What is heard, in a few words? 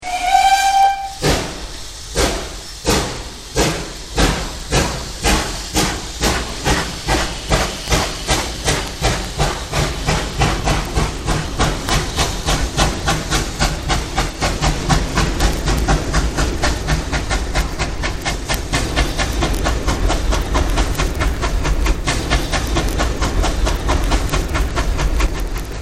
Decca train